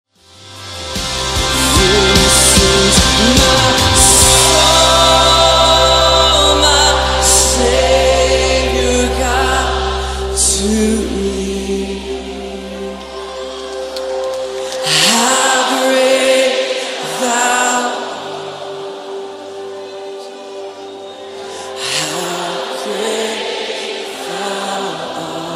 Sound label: How Great Thou Art Chorus